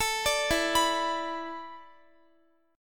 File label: E 7th Suspended 4th, Guitar; E7sus4; arpeggiated; x,x,19,19,16,18